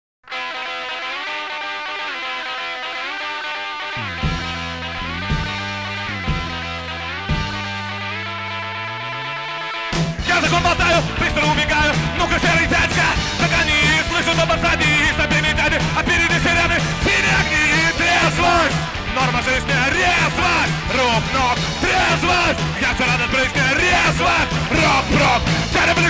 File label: punk